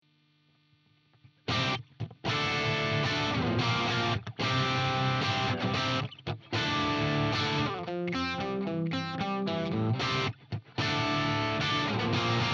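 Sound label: Fender AmPro bridge DIST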